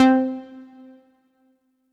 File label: Synth C, Synth Prophet One Shots; Electronisounds